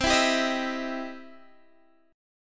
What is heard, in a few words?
E Minor Major 7th Sharp 5th C